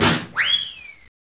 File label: Hit & whistle